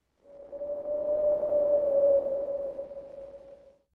Eerie Wind Howling; horror

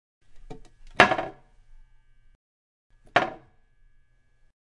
Toilet Seat Lift

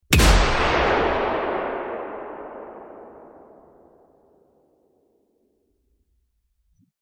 Sniper Rifle Shot; weapon

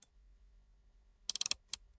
Slider Switch Sound

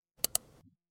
Pop Up Click Sound; facts channel

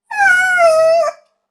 Puppy Whine; Emotional scenes

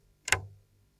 Retro Switch Sound

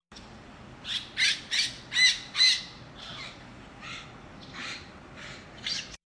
Tropical parrot screeching; birds

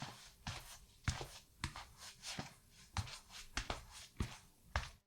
Slippers Sliding

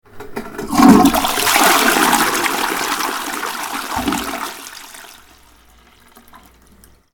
Toilet Flush

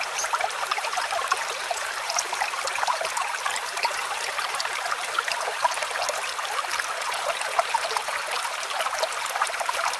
River Stream in the Forest; forest jungle ambience

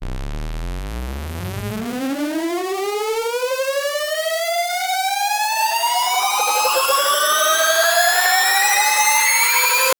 Game Power Up Riser

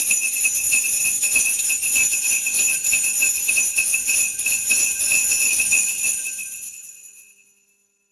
Sleigh Ride Bells Light Wind; christmas

